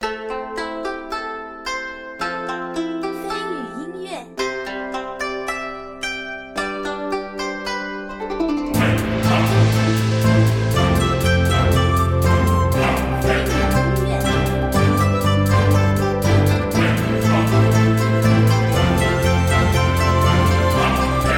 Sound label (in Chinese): 游戏音乐